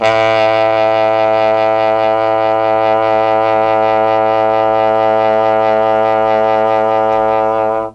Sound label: A1 TROMBONE, mellotron; CHMB TRMBONE